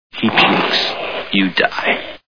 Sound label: pukes